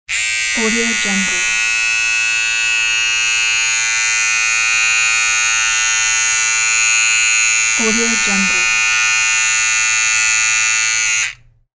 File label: electric hair clippers